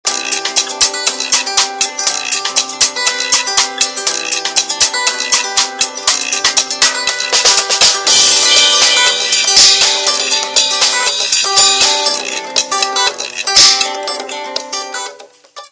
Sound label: jam; Motorola EX300